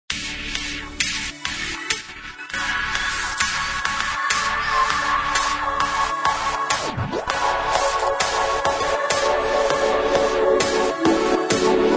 Fashion Bizarre Triangle

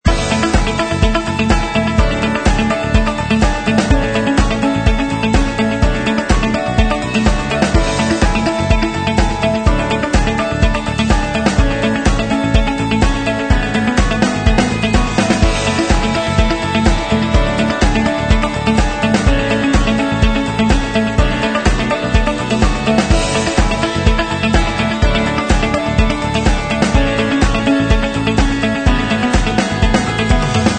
Absolutely Uplifting loop

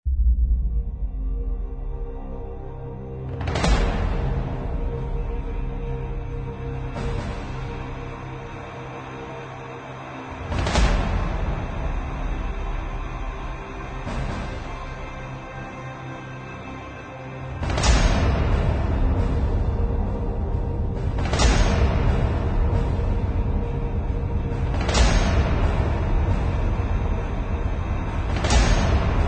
Epic Trailer Percussion and SFX

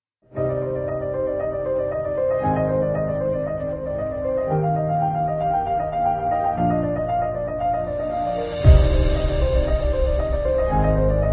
Epic Corporate

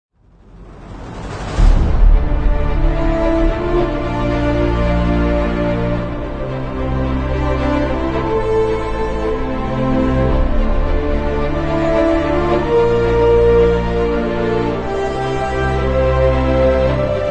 Blockbuster Trailer

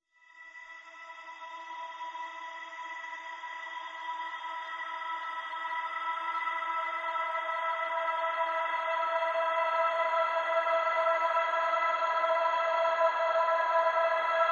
Glassian Key of E

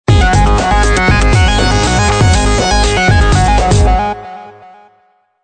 Electro Streak Logo without SFX

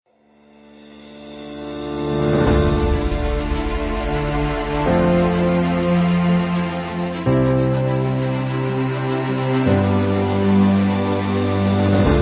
Epic Cinematic Uplifting Inspiring

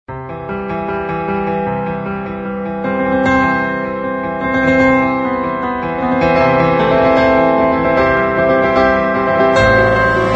Wedding March Piano Version

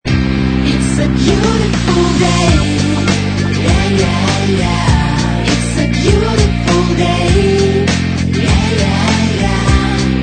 Loop

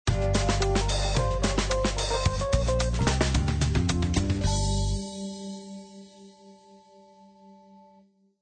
Action logo; 企业标志包 Corporate Logo Pack

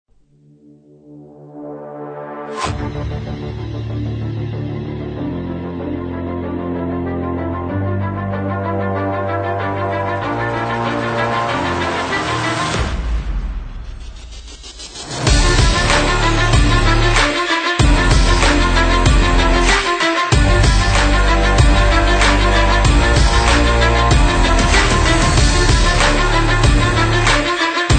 Dramatic Trailer Sport Big Beat

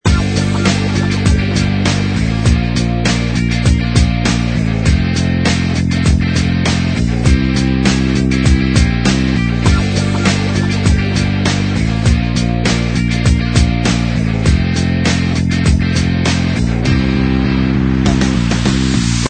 No Vocals Loop

